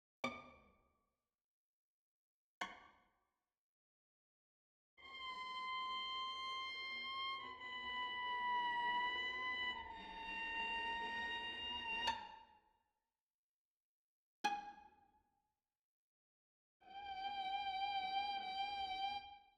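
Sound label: repeat, viola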